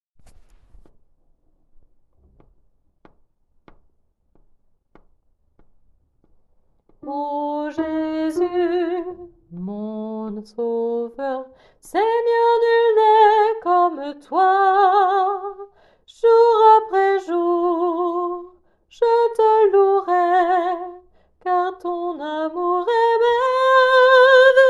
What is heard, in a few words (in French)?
Oh Jesus mon sauveur sopranes